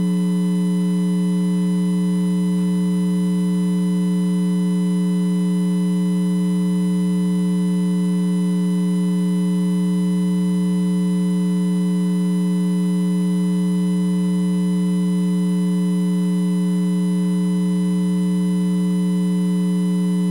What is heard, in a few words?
60 Cycle Hum With Harmonics